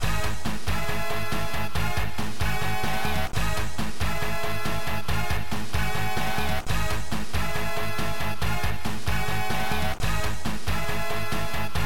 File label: Countdown